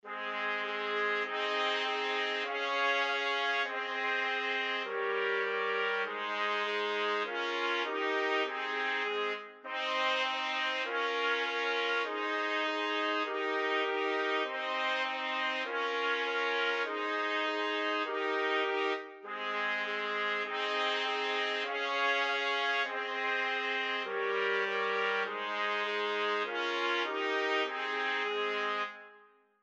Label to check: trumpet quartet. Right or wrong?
Right